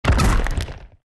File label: Break Block